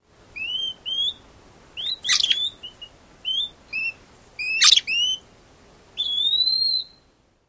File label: interaction calls